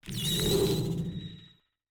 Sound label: lightning bug death